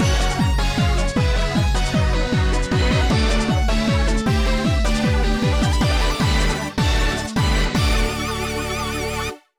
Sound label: Win; Kamen Rider Battle Ganbaride Card Battle Taisen; nds